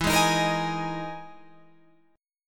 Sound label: E-Major Sharp 11th E, Guitar Open F; EM#11 strummed